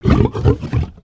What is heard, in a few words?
Pets Lion Happy; creatures; Lego